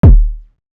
Very Way More Thumpier, Kick, Drums

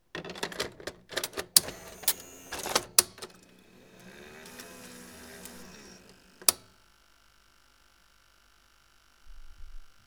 Sony UVW Tape Insert & Eject; SFX; IRL Recorded

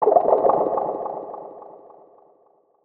Wobbly, Ambient; SFX; Ambient - General

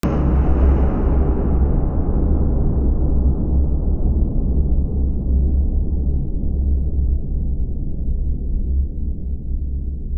The Boom, Impacts - Bass; SFX

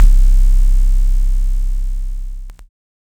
808, Bass

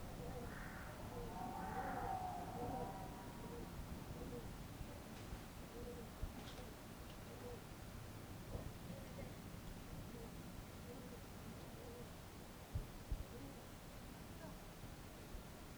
Balcony Enviroment; SFX; IRL Recorded

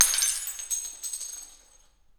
Piece of Glass Breaking; SFX; IRL Recorded

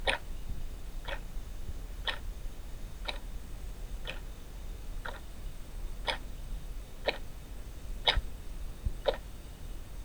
Clock Tick

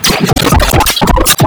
Audio Corruption, Electronic

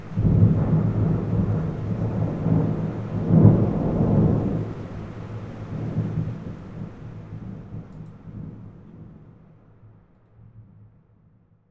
Thunder

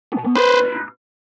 Scream Synth; SFX

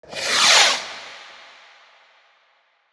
Firework Launch; SFX; IRL Recorded

